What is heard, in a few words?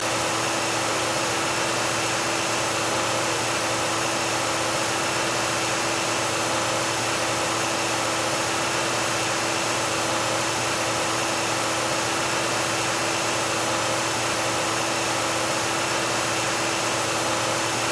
Car Mercury Sable Low Rpm Loop